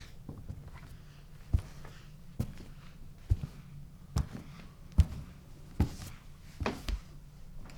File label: Inside Walk